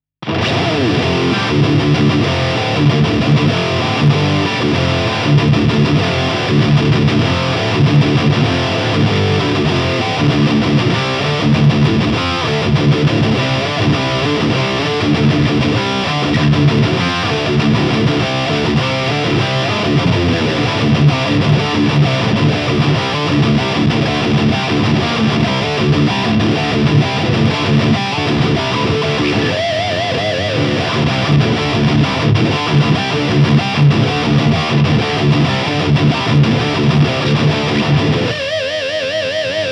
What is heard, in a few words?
Engl Savage 120 MkII High Gain